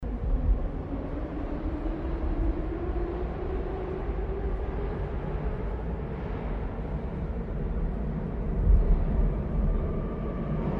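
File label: Moscow metro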